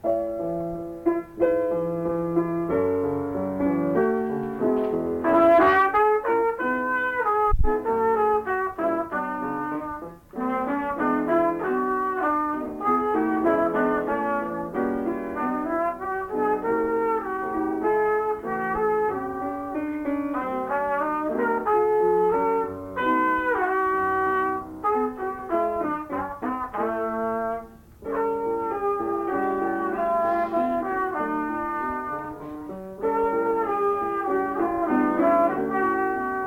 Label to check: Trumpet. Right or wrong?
Right